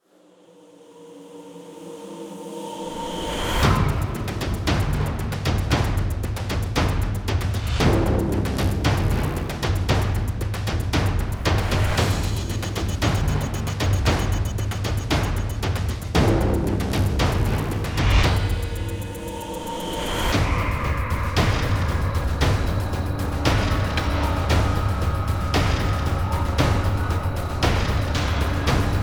music Thud (Loop); Devil Guns Demon Bullet Hell Arena

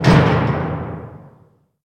lock; Birthday Party Bash; Wii

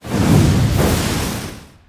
big candle fire

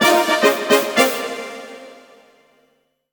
Win Fanfare; yu gi oh the falsebound kingdom

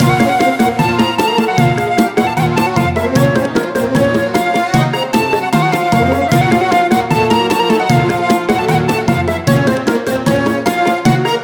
Selection Screen LOOP; Babel Deluxe

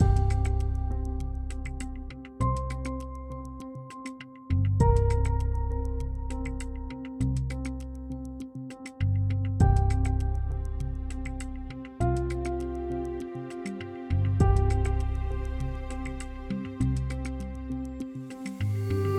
Mood Normal Medium

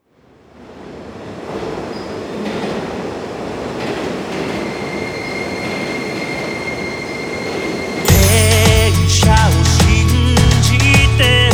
Loop

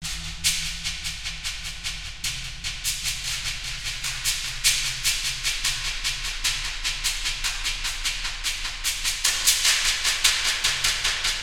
Forest Perc

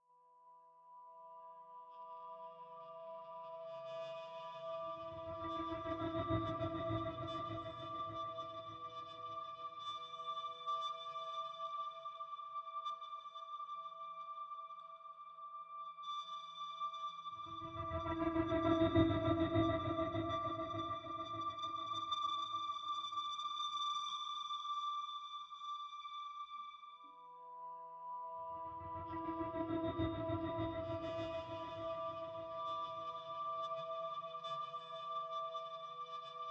Dungeon BGM; mad crown

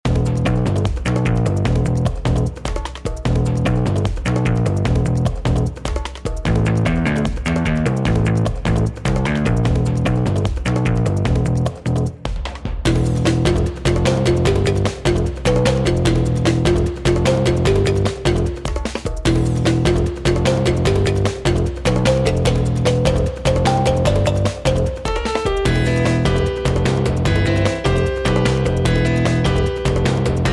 bgm battle; Kardia Fantasy Mamono Hime-tachi to Bouken Monogatari; android

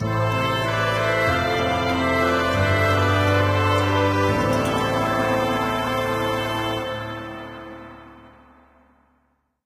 bgm you lose

